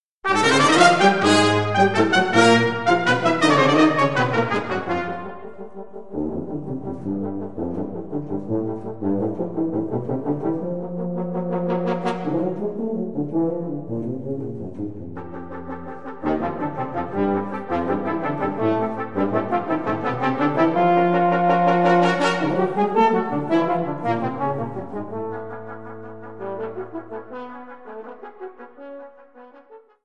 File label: Brass Menagerie